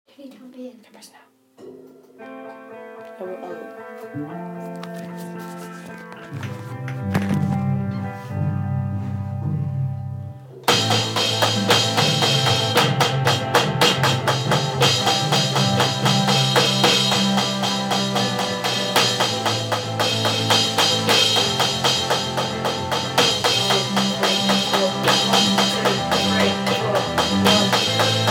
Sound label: simple drumming beat